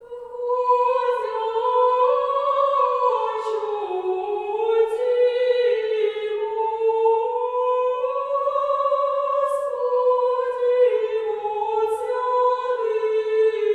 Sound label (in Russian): Входящу Ти Господи. В неделю Цветную на малой вечерни и ныне глас 2